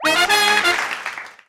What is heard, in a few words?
Win; matgo